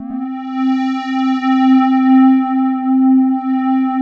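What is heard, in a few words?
square, synth pad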